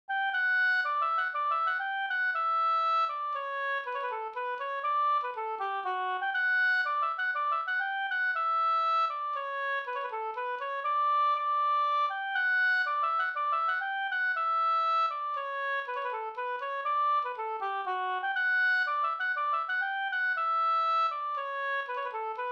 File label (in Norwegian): pols Rorospols; Brurleken e Sulhusgubben utl av Kalle Almlof